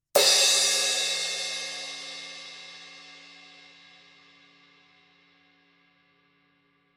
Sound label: formula 602 classic sounds 16 heavy edge; paiste